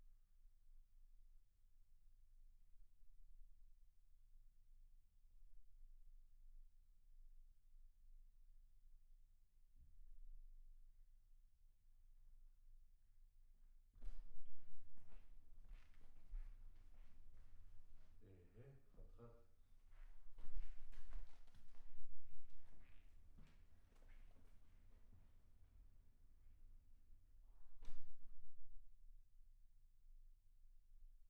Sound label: MAIN VOC